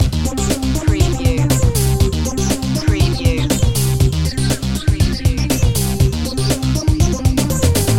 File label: retro electro loop